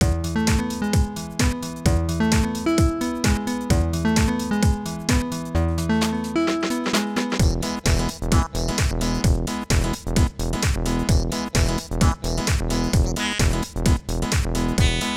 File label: Synth, House Remix